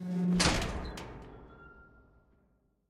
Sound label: Metal Door Shut; Lethal Company